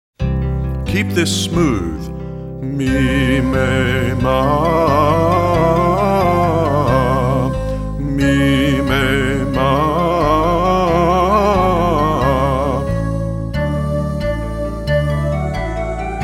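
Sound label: Singing exercise 3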